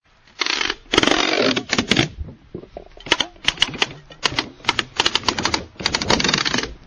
duct tape application; Sound FX Real Life

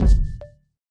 floppy bounce kick

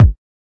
kik

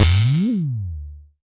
Jomox MBrane, drum machines; Jomox MBrane 11

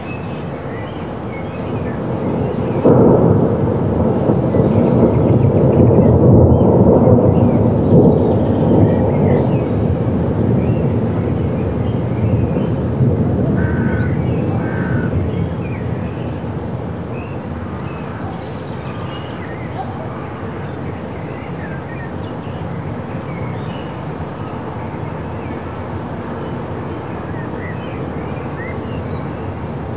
THUNDER

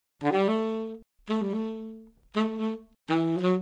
alto

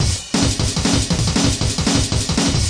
177 break, DnB Breaks; 141 to 185 bpm; Ethex

